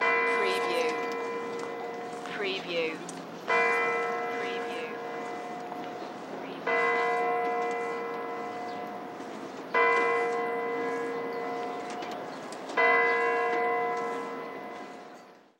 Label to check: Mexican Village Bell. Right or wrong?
Right